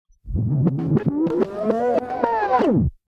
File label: rewind